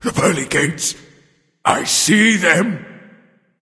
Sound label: death; smite; osiris